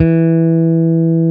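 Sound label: PEAKS BASS E3; PEAKS BS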